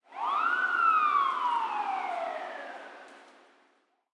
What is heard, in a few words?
Ambience Police Siren; Ambience Menu Police Siren; Subway Surfers City; Android, iOS